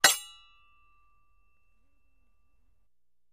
Axe Clashes With Ring Outs; Light Axe Clink With Scrape And Light Ring Out. - Metal Scrape